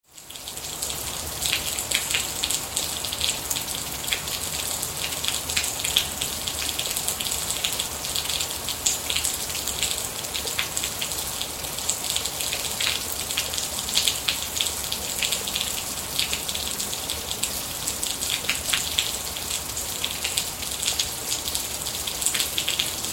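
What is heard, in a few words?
pleasant rain sounds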